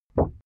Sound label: cardboard wobble short hard